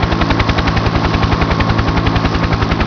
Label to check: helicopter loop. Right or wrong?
Right